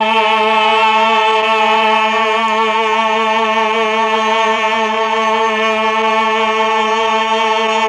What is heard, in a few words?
CHOR; ILIO; Propeller Island Legendary M400